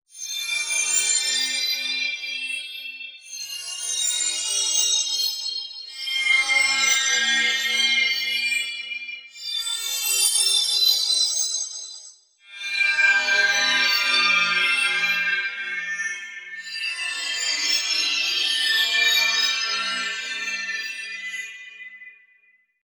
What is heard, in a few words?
glissening swells